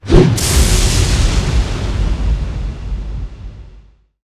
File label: Dragon Tail, Monsters; Olivier Girardot